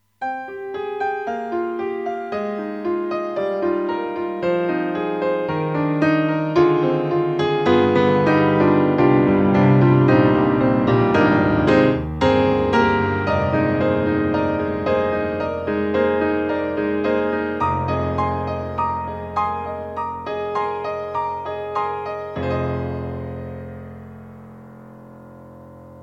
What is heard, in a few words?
Steinway-B; Nemesys; GigaSampler; East West Steinway-B; Library for GigaSampler